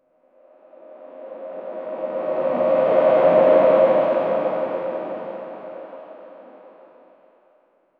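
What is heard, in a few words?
ui sound effect that evokes, time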